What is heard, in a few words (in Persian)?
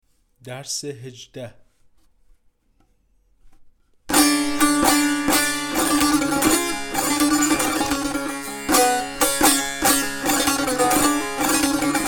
مشق تنبور; تنبور جلد دوم; هفتاد و دو مشق تنبور